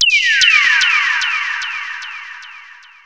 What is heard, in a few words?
SYN FX; X-Static Goldmine 1